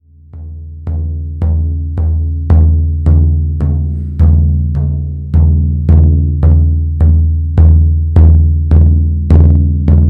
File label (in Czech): samansky bubon